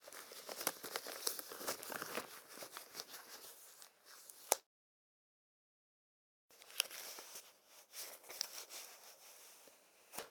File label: latex gloves on & off; human; NS Studios - ultra sound collection